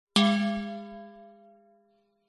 bells medium bell handmade hollow thin hit strike chime